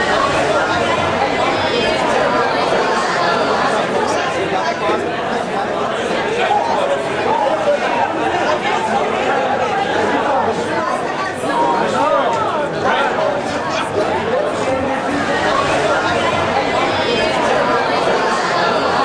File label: Party Crowd Ambient